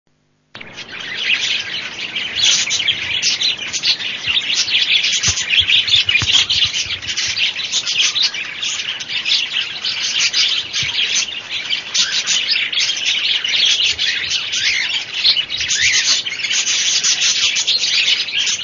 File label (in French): nature son oiseaux